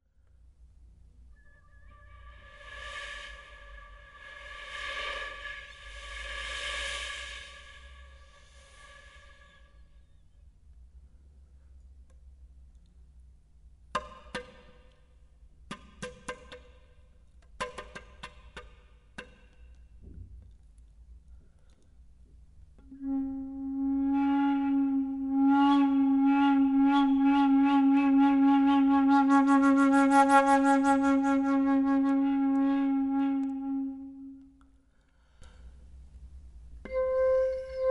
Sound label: Monologue of A Flute